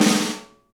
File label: Pop Snare Ghost; Snares